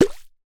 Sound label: comedy bubble pop; sound effects one